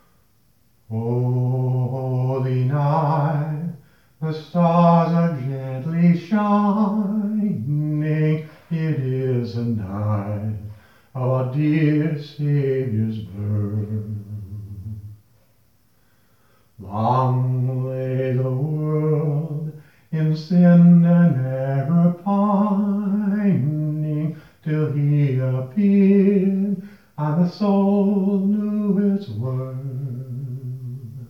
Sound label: Oh Holy Night A Capella